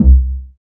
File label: SNGL.BASS, Jungle Rave